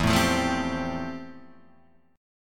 F Major Add 9th E; Fadd9/E strummed; JGuitar

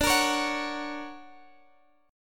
D Augmented Major 7th, chord

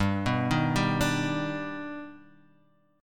D sharp Augmented Major 7th G, chord

